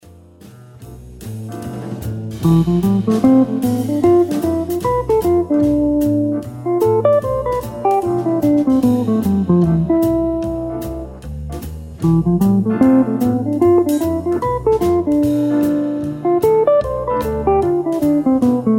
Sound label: locrian mode guitar lick